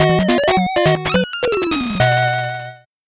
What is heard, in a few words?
8bit jingle fast